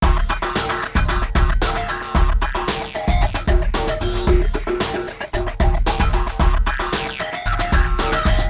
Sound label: hi health groove; FMOD Example